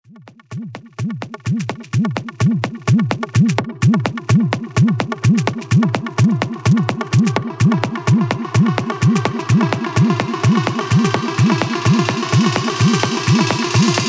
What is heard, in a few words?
128 E